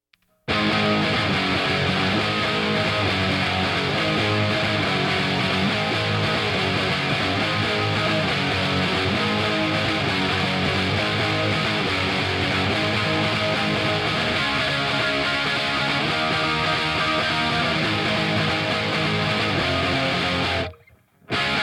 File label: guitar